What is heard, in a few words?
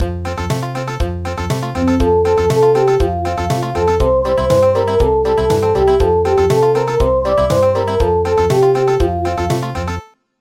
Country; Names of Days